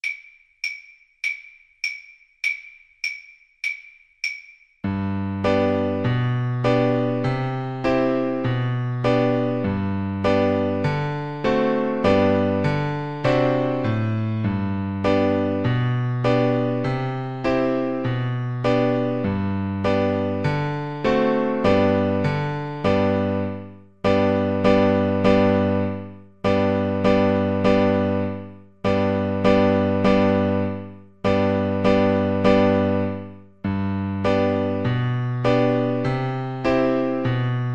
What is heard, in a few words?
guitar